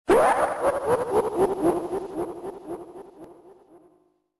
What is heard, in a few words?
laugh; Sonic.exe